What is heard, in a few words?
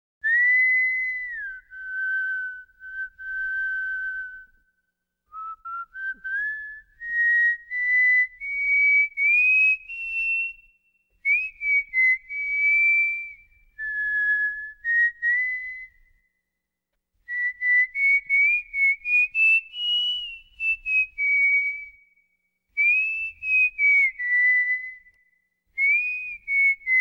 Overture (Whistle Version); Boogiepop Phantom; Wagner; Die Meistersinger Von Nurnberg; 2000 - Boogiepop Phantom - DVD Box Extra CD